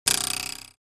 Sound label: Cartoon twang ruler wobbling